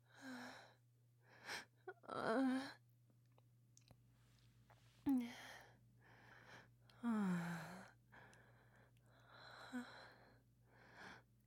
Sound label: sex sounds moans with wet sfx; mostly just [Moans] though a bunch of different kinds